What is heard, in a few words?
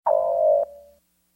Romulan Red Alert